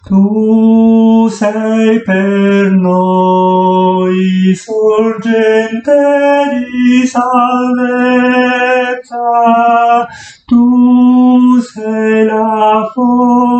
Sorgente di salvezza Tenori

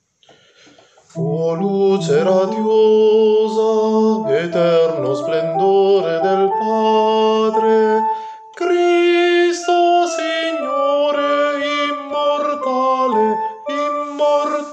O luce soprani

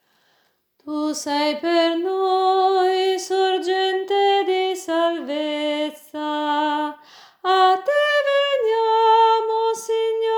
Contralti

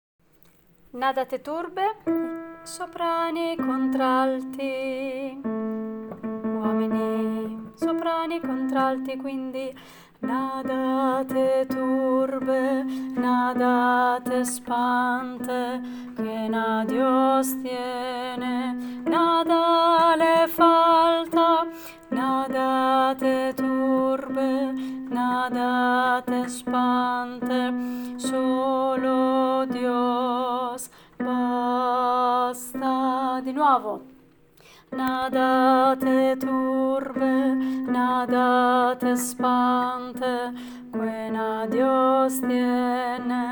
nada te turbe contralti

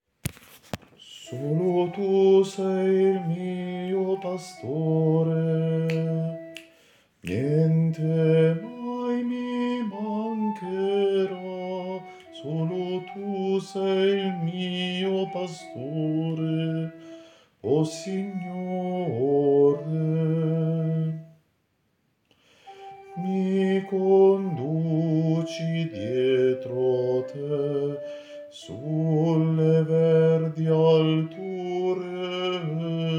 bassi; Perche tu sei con me

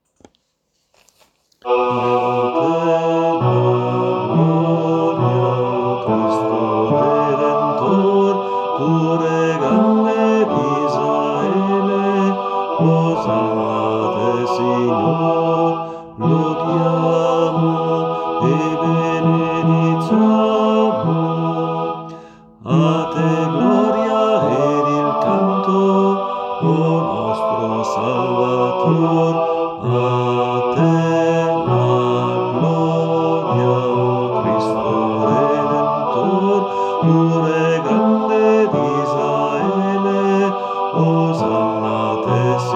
Bassi

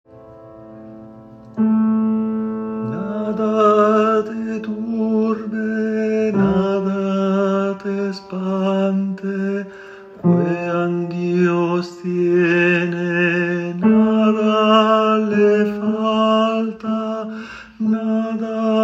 Nada te turbe tenore 1

